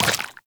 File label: Step Goo, Footsteps, Walk; Warframe; Tenno